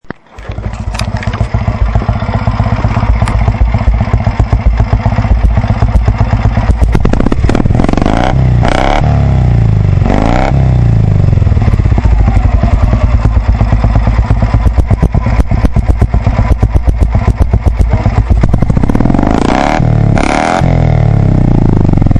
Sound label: douglas 350 flat